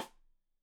Side Stick Stereo; Deeply Sampled Drums; SMDrum

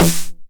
909 SD Tape Sat

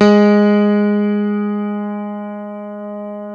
CP-80, Yamaha CP-80; Greytsounds VOL-1

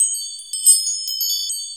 SHORT CHIMES; E-MU Formula 4000 Series Vol. 1; Hip Hop Nation

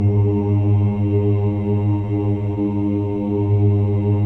CHOIR OOS, G#2; Spectrasonics; Symphony of Voices

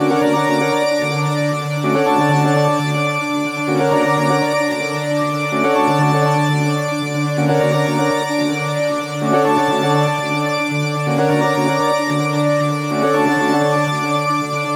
NRG Pad

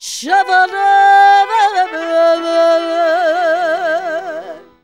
SUNG ROMANI VOX; Best Service; World Colours

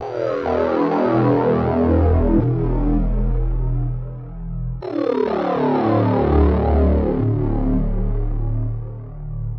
E Bass Dark Overtone, 100; Zero-G; Future Wave

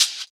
SHAKE; Houseworx; Drums; Perc

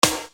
Snare Dr

